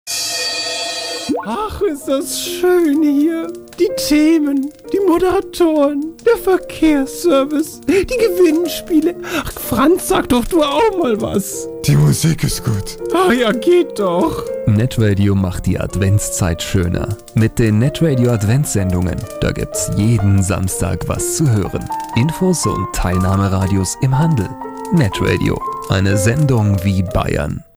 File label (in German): Jingle; Adventssendungen